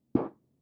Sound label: Ground Hit